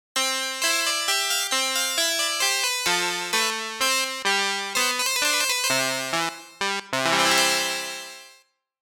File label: Harpsichord; Jupiter8; OPXPROII